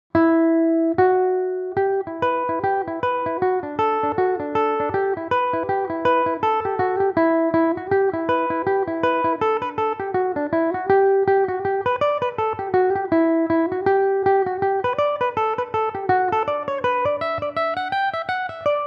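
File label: E minor, irish mandolin; colonel mcbain; belmuse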